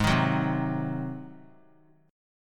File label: Ab 7th Ab down; Ab7 strummed; JGuitar